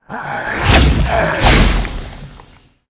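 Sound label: ant attack, monster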